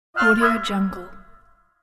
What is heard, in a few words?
sick sound